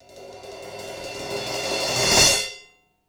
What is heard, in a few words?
Live Tinkle, Drum Hits; Zero-G; Soundclash